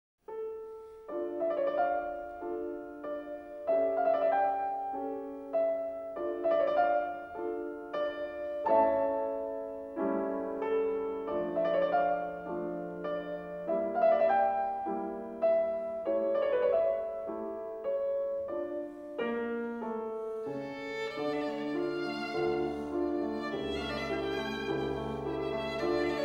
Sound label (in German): Violinsonate F-Dur - Tema, Andante; WA Mozart - The Complete Works 170CD; Volume 4 - Chamber Music-Violin Sonatas-Church Sonatas